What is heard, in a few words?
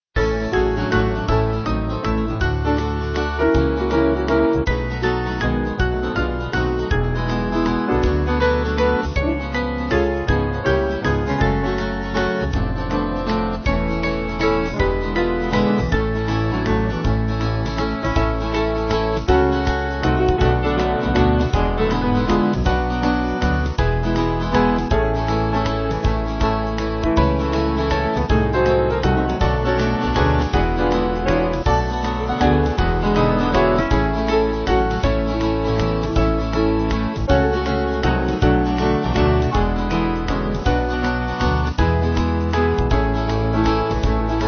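Band, Music Backing Files for Churches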